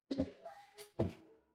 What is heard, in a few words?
ui drag